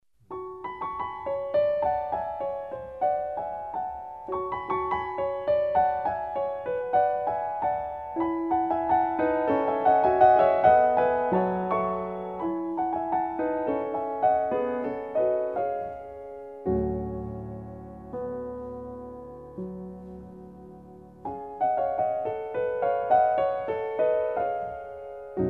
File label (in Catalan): Piano